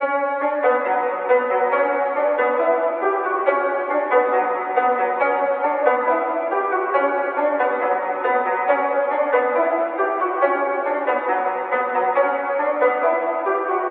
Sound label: Synth Melody 138bpm B Trap Drill